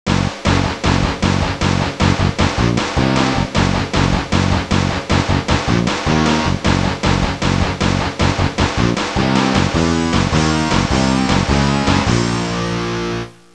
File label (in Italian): suonerie musica rock